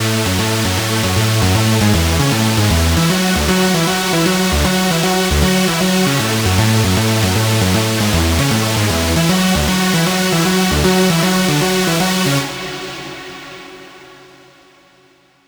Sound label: screech loop F# min 155; Music Loops; GhostHack